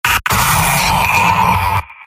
soldier pain severe; tf; mvm

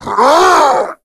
hulk pain fire; Left 4 Dead; Source Engine; tank

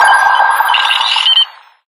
quest status tick advanced pda

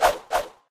slap swing; tf

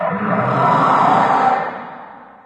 inside battle antlion, prison; ambient; source engine; hl2; video games

